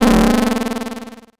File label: Title Transition Delay Short